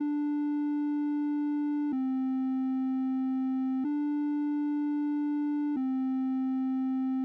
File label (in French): BASSE